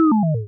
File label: button Cancel; gamerip; marble maid